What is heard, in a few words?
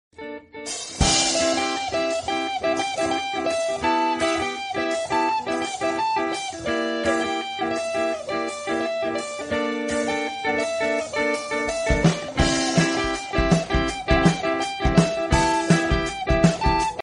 iphone 8 plus french ringtone